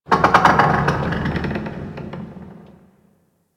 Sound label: Ice Metal Creak; Ambience; Warframe; Corpus Ice Planet